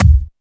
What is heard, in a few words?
BD, Dance Mega Drums, HIP